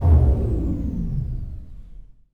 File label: sfx sci fi; Masterbits - Switch FX